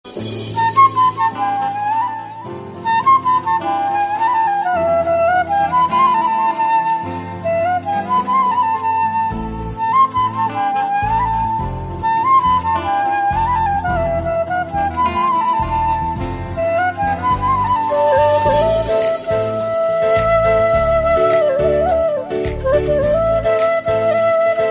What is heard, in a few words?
flute; India